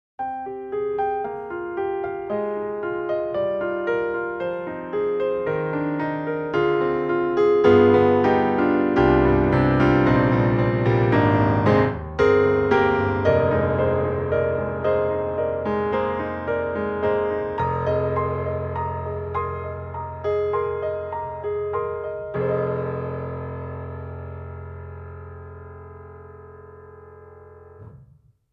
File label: Rosewood Grand; Orange Tree Samples; Evolution Rosewood Grand; Petit Paris